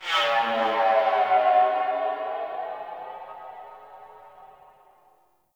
Crp Ship Tech Flow Texture Med, Corpus Ship Ambience